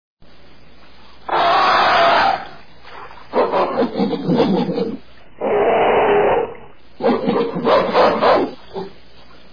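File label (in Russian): крупная обезьяна кричит и бесится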